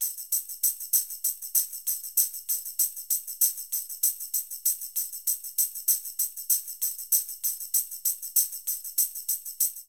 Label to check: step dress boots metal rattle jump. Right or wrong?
Wrong